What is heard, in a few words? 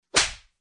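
Slap in the face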